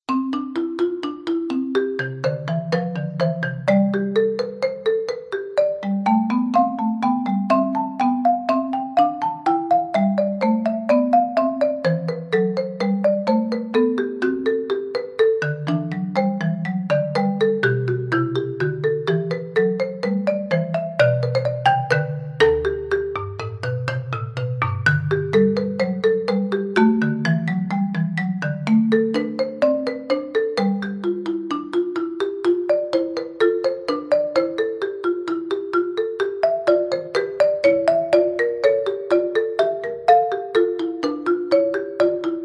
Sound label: BWV 772 Invention In C Dur, Mallets; Marimba Solo; Bach for Mallets